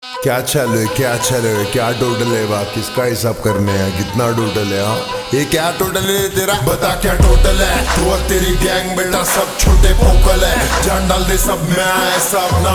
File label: Ringtone Slowed Reverb